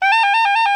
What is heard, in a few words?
TRIL G-A HI, SOPRNO